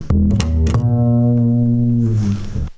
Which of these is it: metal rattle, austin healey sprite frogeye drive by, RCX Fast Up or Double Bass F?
Double Bass F